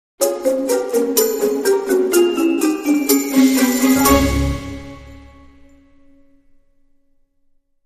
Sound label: Dramedy Sting